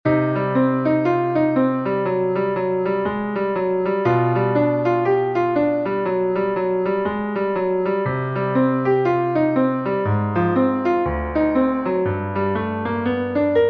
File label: Jazz Exercise